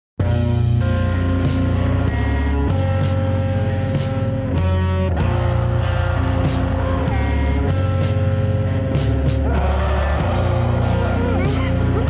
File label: sorrow instrumental looped extended